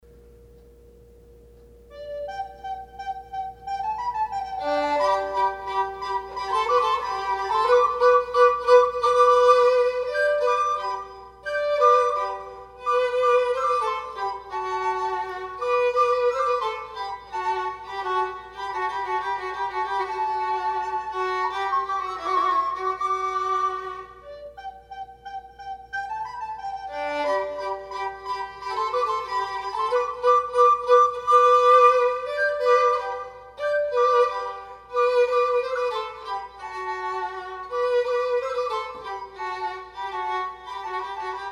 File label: Rejoicing Soprano Violin; Handel